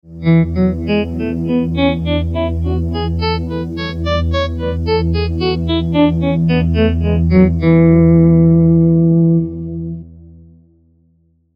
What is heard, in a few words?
E Myxolydisch Scale